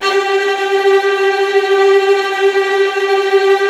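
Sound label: STR TREMOLO, Virtuoso Strings, G2, right; ILIO; Kirk Hunter Virtuoso Strings VOL-3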